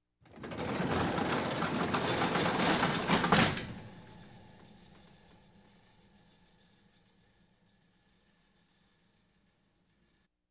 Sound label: Household Phones; Valentino Production Sound Effects Library CD50